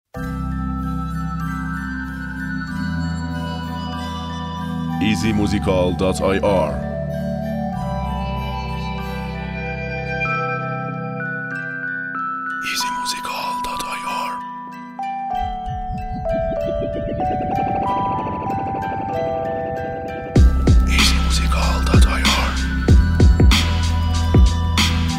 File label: Beat